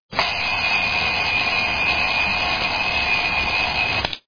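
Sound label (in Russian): звонок будильника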